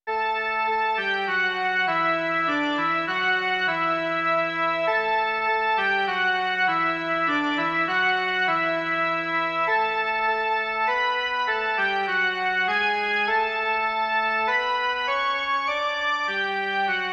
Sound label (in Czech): jen melodie; Skloň se k nám svou milostí